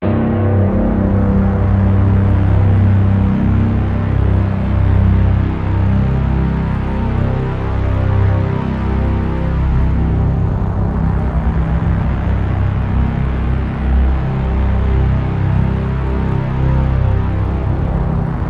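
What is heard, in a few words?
drone nice buzz C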